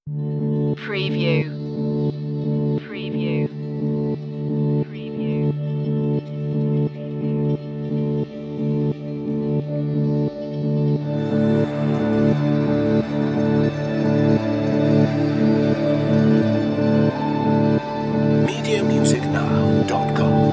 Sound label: ambient hopeful